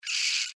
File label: Insect Voice Cry, Animal, Insect, Voice, Cry, Emotion, Vocalization, Bug; Pain